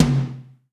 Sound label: Tom Acoustic; One Shots; Toms; Anticipation; Ghosthack; Drum Mega Bundle Demo; Drum Hero 5